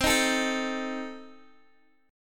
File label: C Minor 6th, C, down, Guitar, Open D